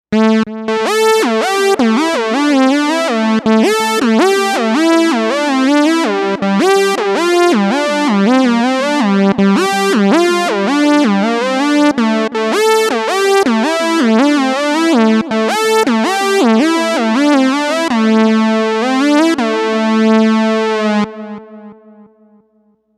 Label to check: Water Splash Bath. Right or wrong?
Wrong